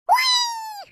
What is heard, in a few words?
penguins